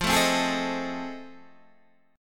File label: F 7th Sharp 9th, Guitar, Standard; F7#9 strummed; 13,12,10,13,10,11